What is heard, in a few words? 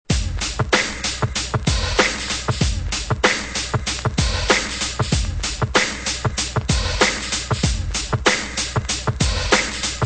drum loops